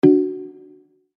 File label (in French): BIP progression